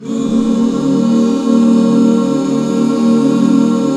Eb 7, back chords; Sound & Vision - Gigapack II CD 1

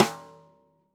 stickshot - snares on; JazzFunkKit

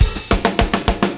BACKBONE, Backbeat

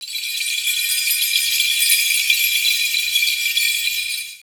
Bells, Percussion; Ambient Grooves

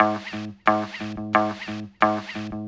GTR, Hip-Hop & R&B; USB Soundscan vol.47; AKAI

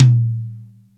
TOM; Crypton - DrumBase5000; DRY KITS

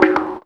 127 DRM, Fresh Disco House

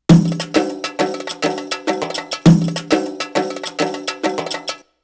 Arabic Rhythms

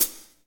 HH, Kits, Rev

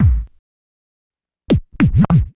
Scratch, Trance

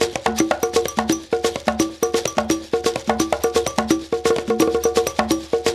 TAP, African Tap; Spectrasonics; Supreme Beats

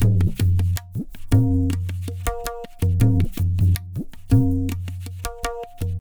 Pure Tabla, 080BPM; Zero-G

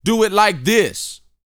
VOCAL; Spectrasonics Vocal Planet

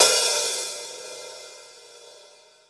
open, Drums Percussion; Drum Bass; Zero-G; Total Drum Bass

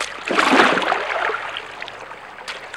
ROW, Boat Rowing; Water

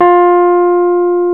PNO, Rhodes Mk.V; Keyboards of The 60's and 70's

